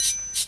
SHAKERS; Best Service; Dance Mega Drums CD2

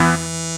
SYN E, BUZZ SYNER

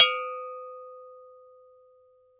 GANKOKWE, Gankokwe Bells, Percussion; Drums&Percussion; E-MU Producer Series Vol. 7 – Old World Instruments

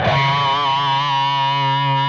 PRS PINCH, Guitars

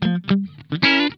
INTERVAL, Funk Guitar; Zero G - Funk Guitar

